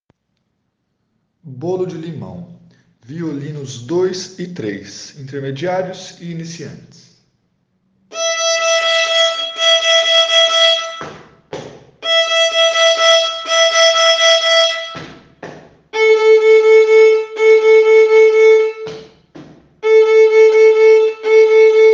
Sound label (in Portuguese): Violinos 2 e 3; violino kids